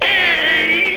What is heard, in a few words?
Inked Humanoid Pain; Enemies; Warframe; Shadowgrapher